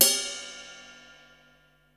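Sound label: cymbal ride cup; 300 Drum Machines; Yamaha Motif ES-Series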